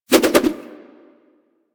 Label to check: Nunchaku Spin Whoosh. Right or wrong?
Right